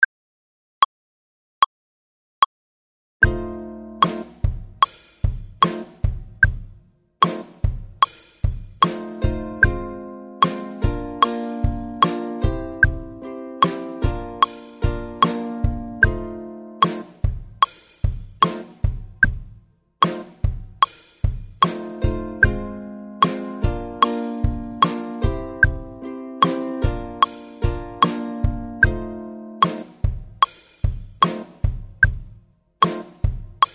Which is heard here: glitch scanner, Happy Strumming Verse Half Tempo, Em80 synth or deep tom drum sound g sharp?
Happy Strumming Verse Half Tempo